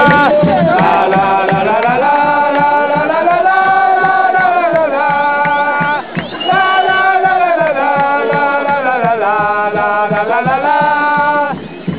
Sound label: lalalalala